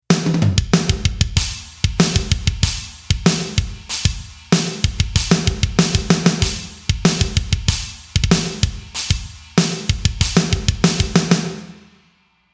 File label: SNARE; MANSION